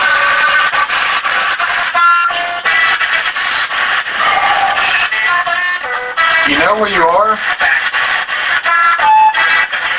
Unexplained Loud Breath

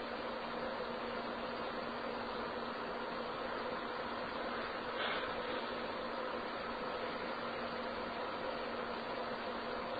Room with Fireplace Unexplained breath or whisper; Turner Home; 2nd Floor

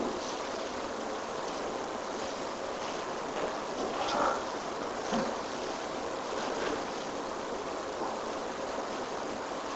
Upstairs Hallway Unexplained Breath and noise

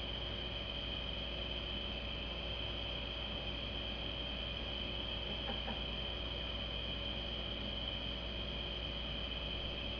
Unexplained Laugh; Case 031 Solce Home

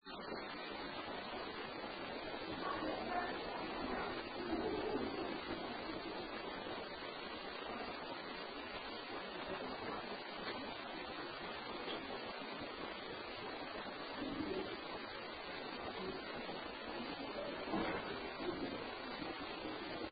UNEXPLAINED NOISES, Basement; Case 023 Major Graham Mansion

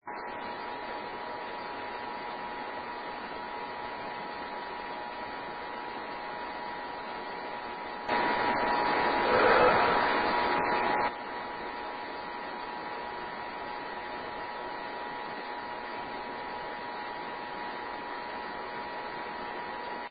Art Room breath; Fuel Building; 2nd Floor; amplified

